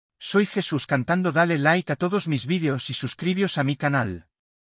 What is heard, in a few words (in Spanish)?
soy patagallo cantando